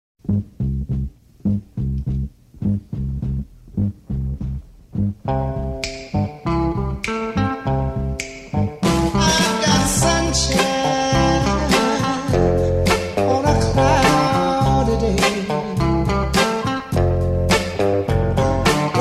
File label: polyphonic